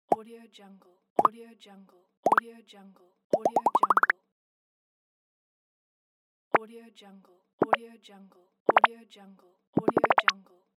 the pops

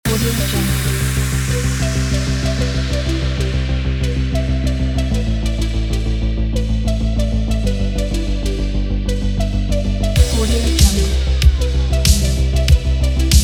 80s retro synthpop

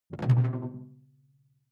ui sci fi alien splash detuned soft

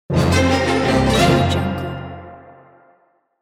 level up sound

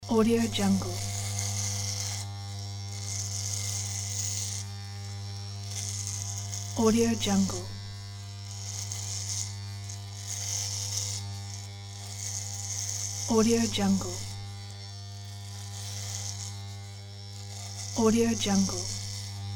hair clippers

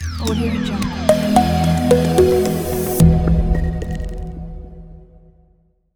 corporate logo

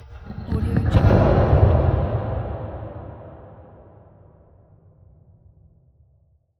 distant dungeon door shut

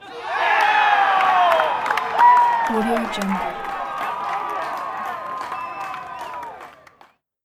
sports crowd cheering after a big play

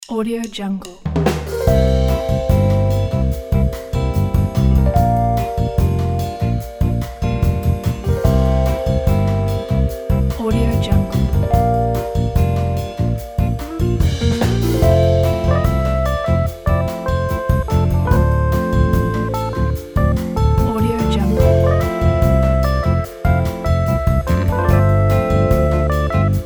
elevator music

